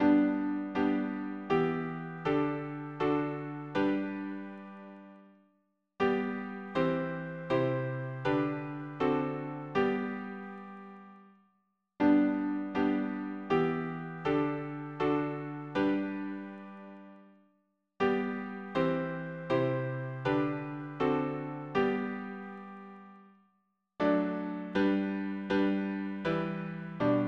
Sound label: piano